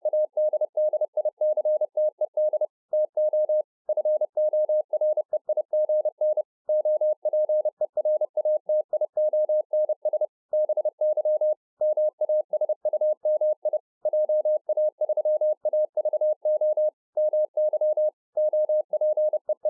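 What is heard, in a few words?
Morse code, 30 WPM; ADDICTED TO FOREIGN OPERATIONS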